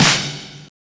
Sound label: Ordinary Snare Mix; percussion